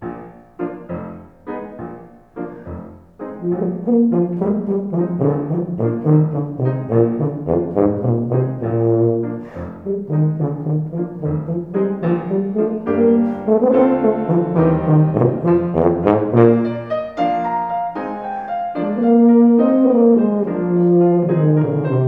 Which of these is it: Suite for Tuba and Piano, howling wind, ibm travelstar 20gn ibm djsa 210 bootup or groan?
Suite for Tuba and Piano